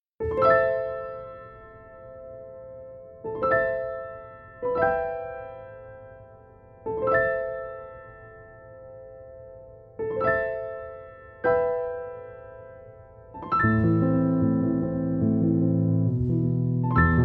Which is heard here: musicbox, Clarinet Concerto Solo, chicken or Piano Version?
Piano Version